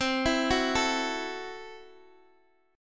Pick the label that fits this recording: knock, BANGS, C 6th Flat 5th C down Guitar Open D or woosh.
C 6th Flat 5th C down Guitar Open D